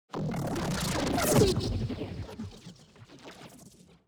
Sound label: Bass Synth Wooshes; neuro; BIG5AUDIO